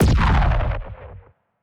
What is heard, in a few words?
titan hit explosion tonal, weapons; kaiju; Stellaris; biogenesis